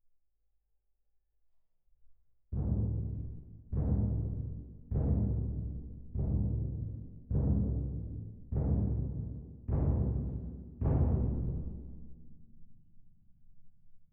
Twin R Perc

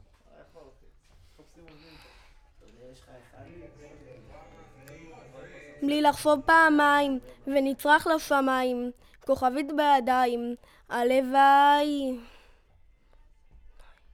VOC

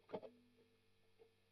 Strum Octav

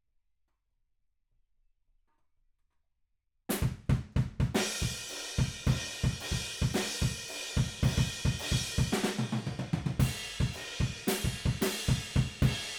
Room

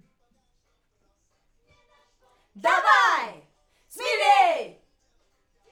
Backs AKG

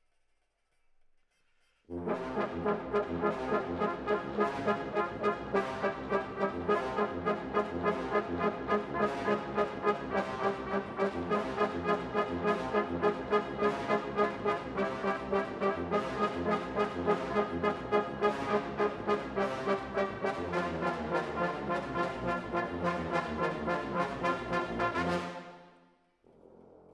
Horns Over

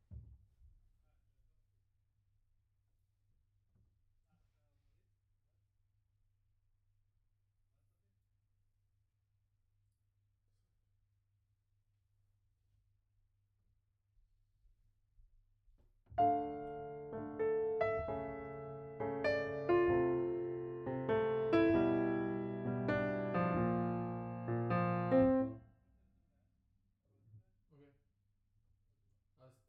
Piano B

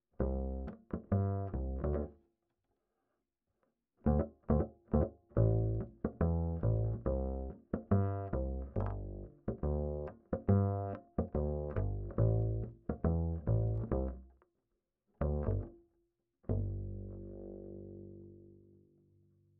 Bass Line

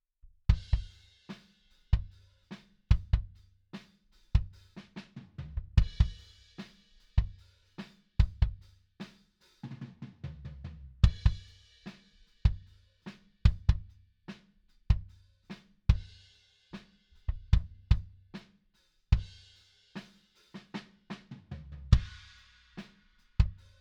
Kick

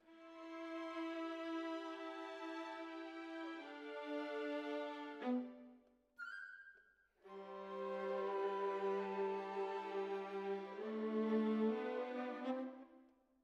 Violas, jazz for piano

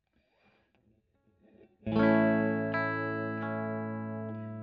E. GTR Royer

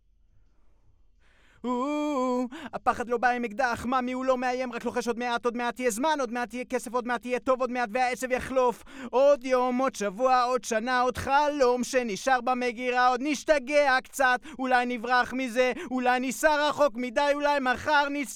VOX

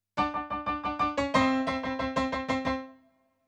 PIANO

